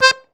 AKK; Best Service; Alpine Volksmusik; AKKORDEON

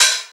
Open Hihat

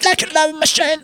LOVE MACHINE, Funky Vocals; USB Soundscan vol.18; AKAI

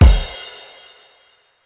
Bass Drum + Crash Cymbal, Yamaha DD-35

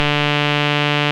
rave beep D2; Best Service Alpha Dance vol.2

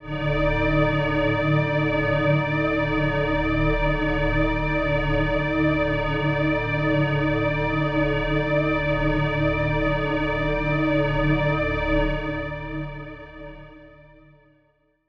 One Shot Drone D, dystopian drone; One-Shot Drones

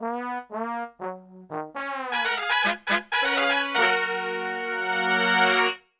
MEX F#, Brass, MEXICO LICK; Best Service; Brass Super Section

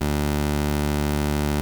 Prophet 5 D3, Synthesizer; Hollywood Edge - Giorgio Moroder Rare Synthesizer Collection

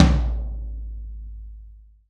TOM TOM, Real Kit; 90 s Sample CDs; Sound & Vision - Gigapack I CD 1; Real-Kit s 4

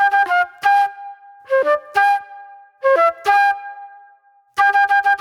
Soul-Jazz Flute, Call And Response

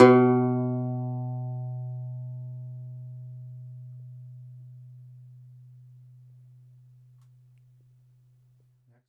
DOMRA, Plucked Strings, Segundo Domra

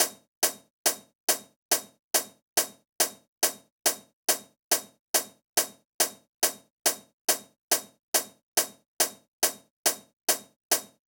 drum Loop 140BPM HH

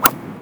GOLF, sports; AKAI S6000 CD-ROM - Volume 1

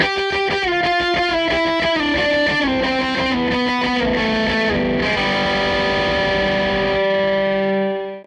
Les Guitar Loop

